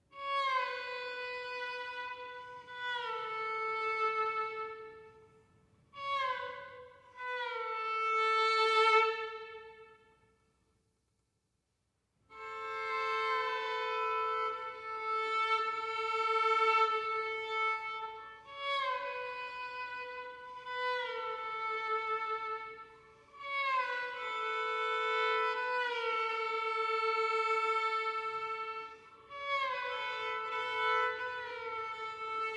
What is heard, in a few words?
Crochet equals 68, feel a faster underlying pulse